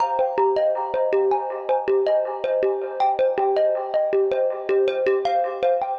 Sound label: synth, ambient, 160, C; Keys; eJay